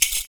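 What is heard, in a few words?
PERC, percussions; Univers Sons - Raricussions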